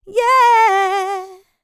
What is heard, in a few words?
LICKS, Vocal, R&B; ILIO; Dance; LT R+B